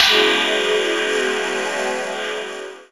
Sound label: Spring Metal; Jungle; Blu Mar Ten - Jungle Jungle - 1989 to 1999 Samplepack